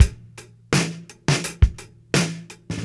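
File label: 84 bpm, beat loops; Zero-G; Monster Beats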